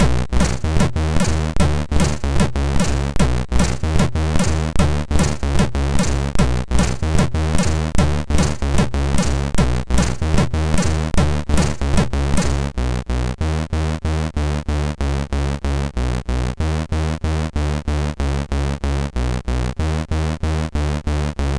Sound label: sid MOS6581R3; Square Scape 2